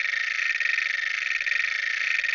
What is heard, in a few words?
wee car